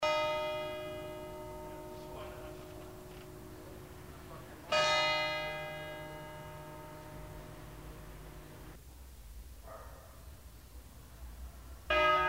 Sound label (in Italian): Funerale Otrapasso, campane